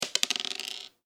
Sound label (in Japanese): 小さいシングルダイス